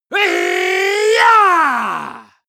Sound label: male combat attack long